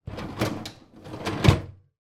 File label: household dishwasher top wire basket pull out then push back in